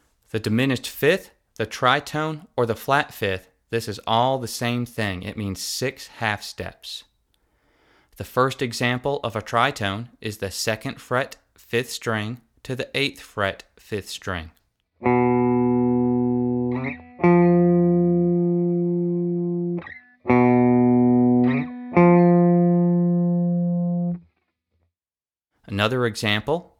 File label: Diminished 5th Tritone Or Flat 5